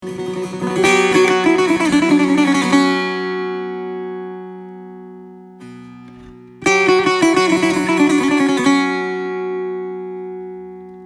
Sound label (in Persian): Taknavaziye Setar